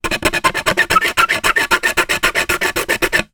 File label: plastic thin phone cover scratch with knife; foley; zapsplat